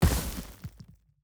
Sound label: environment block digged up